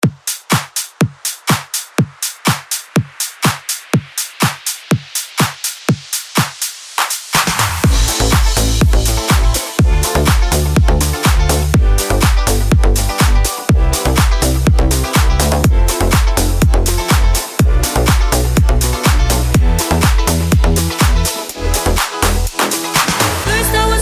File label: future house redrum 123 bpm